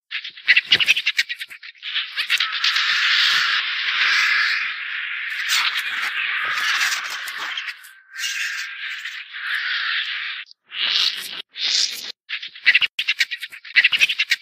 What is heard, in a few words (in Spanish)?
Sonido del Buitre.sonidos cortos de animales